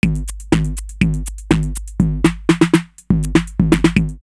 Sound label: 808 Fuzzy 122, drumloops, DrumMachines; X-Static Goldmine